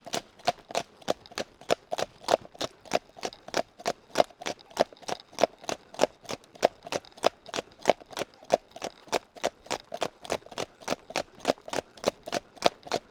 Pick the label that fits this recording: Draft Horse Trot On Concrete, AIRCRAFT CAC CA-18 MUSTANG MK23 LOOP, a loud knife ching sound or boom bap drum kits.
Draft Horse Trot On Concrete